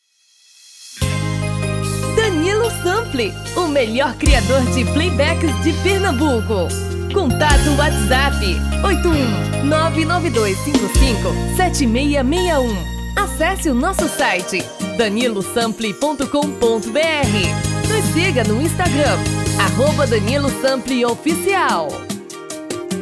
BREGA um tom acima; tom feminino

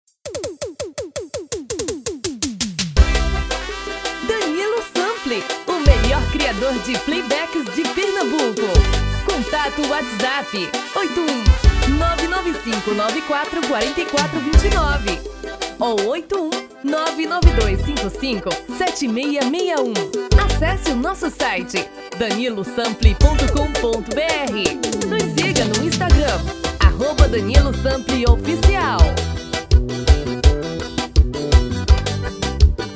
Piseiro

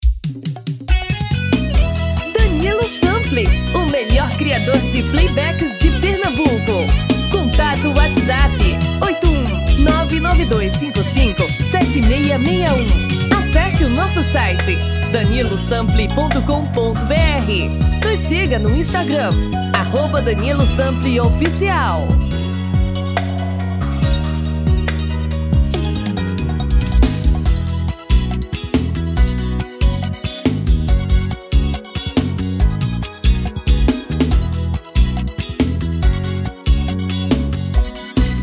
BREGA

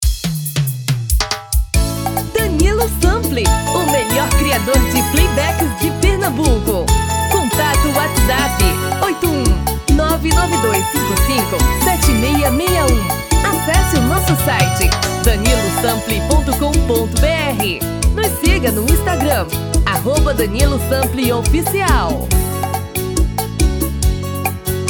Serestao

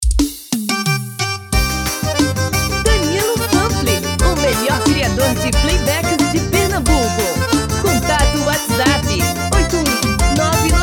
Xote tom feminino

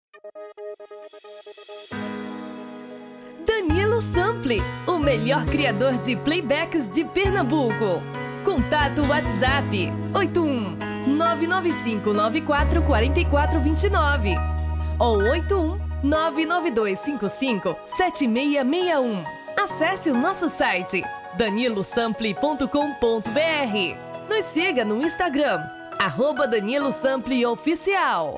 masculino

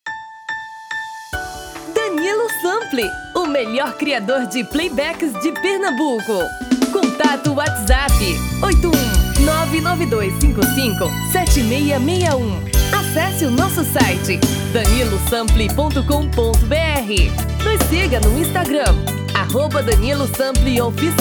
BREGA; um tom acima

